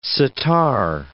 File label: sitar